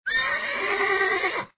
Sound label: Horse Crying; Age of War